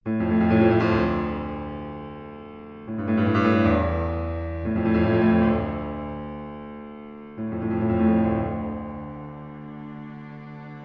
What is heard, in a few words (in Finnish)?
Pianokvintetto Elegia; Sallinen; Op. 92